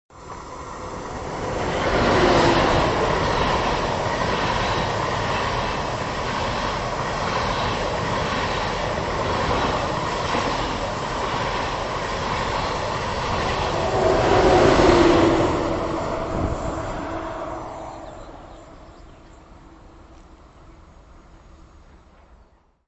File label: ICE